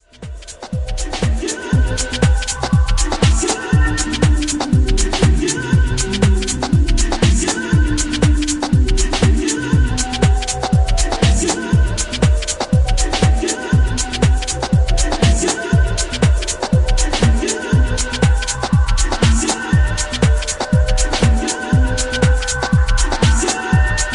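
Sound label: two bar house music and chord stuff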